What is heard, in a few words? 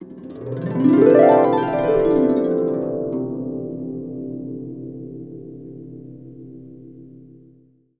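harp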